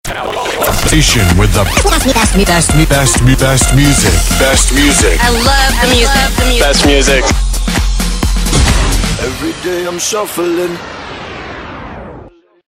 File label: DJ Sweep, Radio style ads intros sweeps & jingles